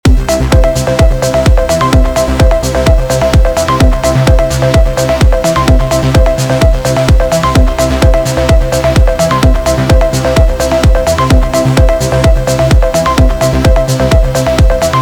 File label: only maj3